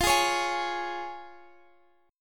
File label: F Minor Sharp 5th F down; strummed